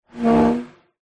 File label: foley plastic thin object slide table vibrate rub; zapsplat